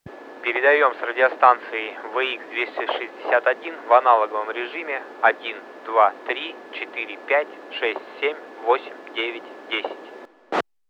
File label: motorola vx 261 tx